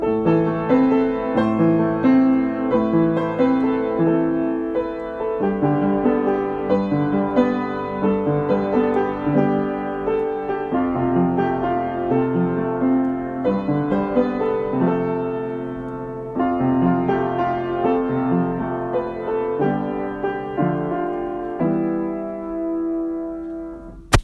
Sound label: Piano